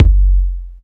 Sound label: KICK, Big Beat; Big Beat Samples & More; AKAI